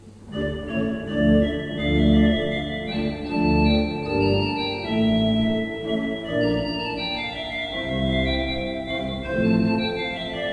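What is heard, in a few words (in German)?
Orgel, pfeifenorgeln; Seifert Kevelaer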